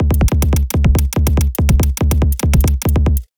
break tweaking

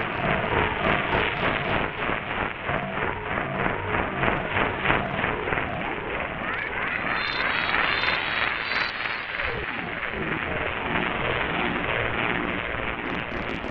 glitch osc bbd

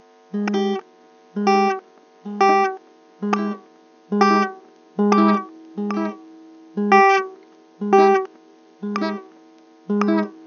guitars

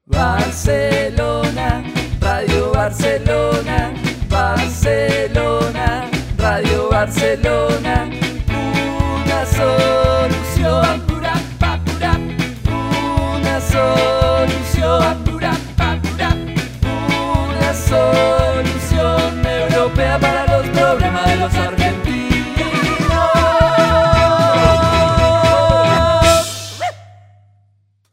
Radio Barcelona Swing

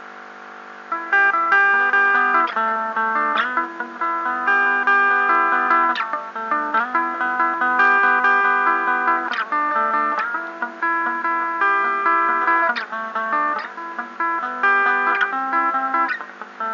guitar

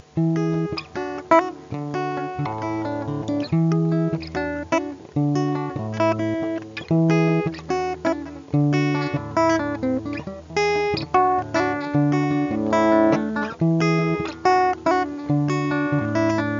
guitar

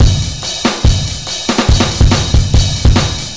solo drums

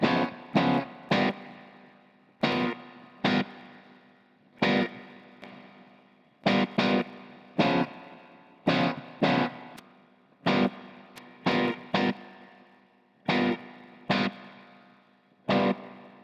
Plays Guitar

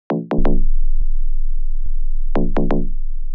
break tweaking

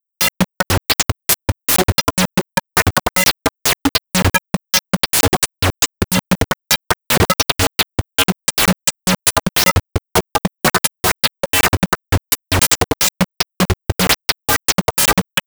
dirty idm base

